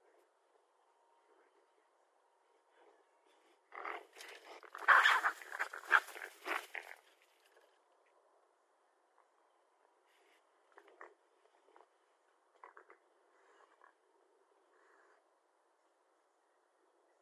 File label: Raccoon; Northern Raccoon (Procyon lotor)